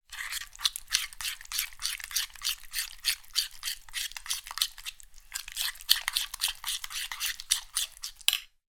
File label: food mixing tuna and mayonnaise in bowl with fork